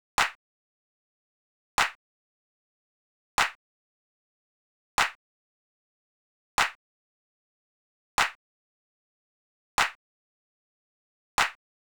Nostalgia Drum Loop 150 BPM Percussion; Cymatics - ANALOG EVOLUTION Beta

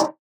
Perc Conga; Drum One Shots

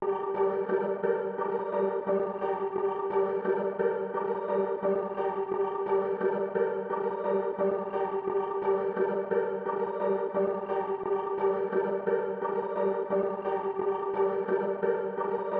87 BPM G Min Arp; Melody; Trap; Loop Stems; Cymatics; 2022 Melody Collection - Trap Pt 1; Antidote

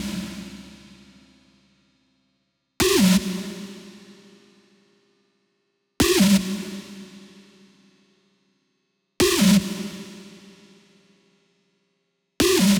Synth Cm 150BPM, Techno; Rave; Melody Kits; Stems; GhostHack; Ghosthack - 7 Free Rave Melody Kits 2025

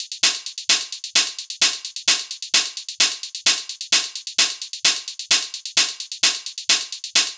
Techno 130bpm Hihats; EDM Drums; Drum Kits; Stems; Ghosthack; Drum Mega Bundle; Ultimate EDM Drums; Techno Kit 11

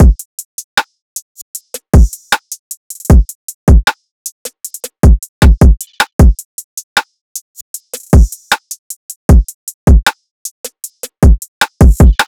Drum Loop - 155 BPM, trap; Drum Loops; Jackpot Drum Loop; Cymatics; Trap Starter Pack

